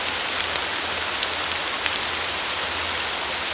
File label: rain; Mafie 1